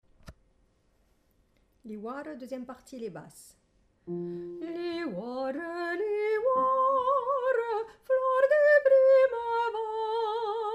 Basse

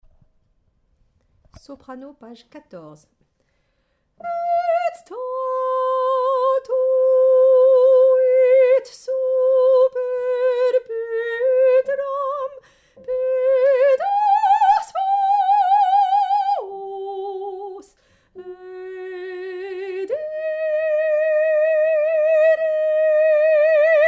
psaume Soprano

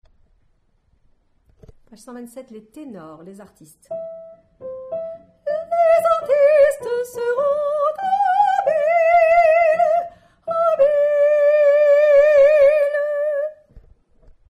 Tenor

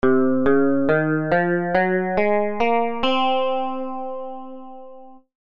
mode do locrien

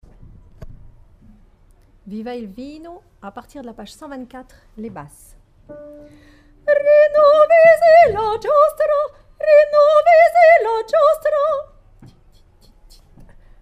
Basse; vino

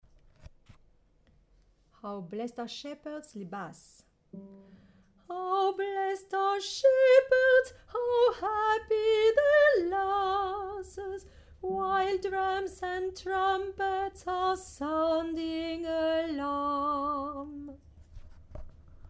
Basse

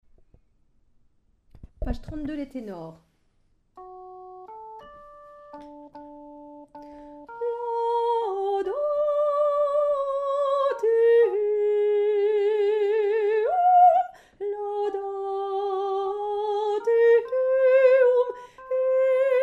psaume Tenor

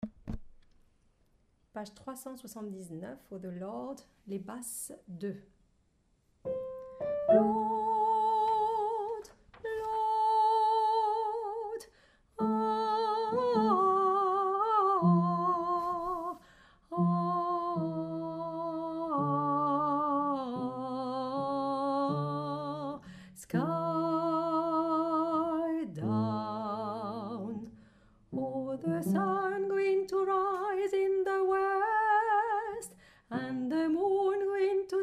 Basse

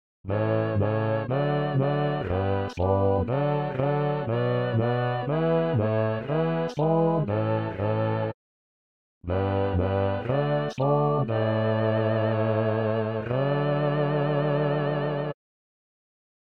Basse; Bene respondere

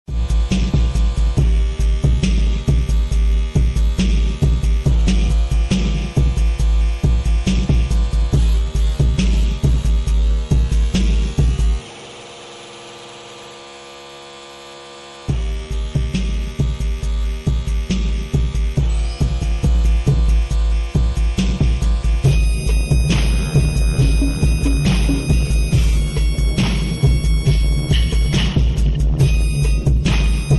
violon; mindustry; violon prof

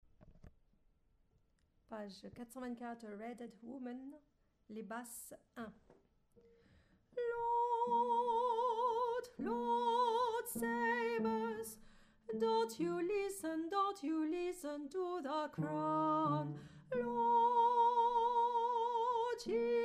Basse

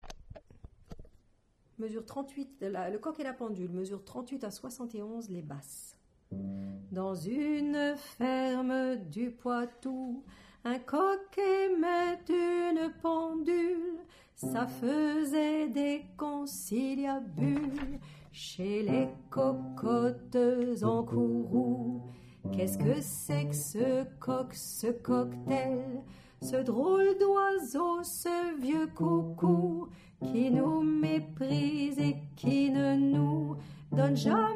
Basse

